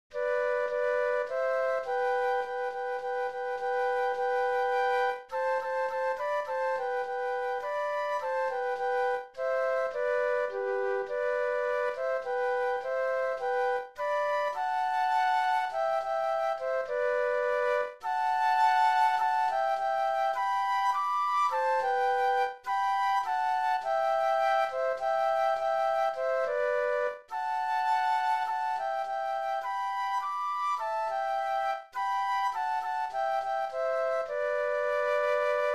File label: Flute Duet